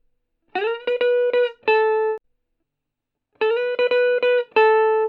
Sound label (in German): melodie mix